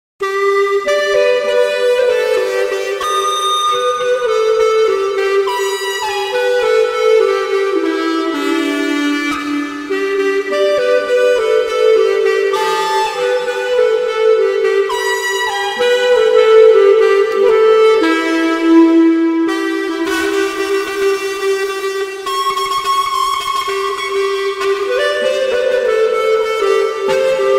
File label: Clarinet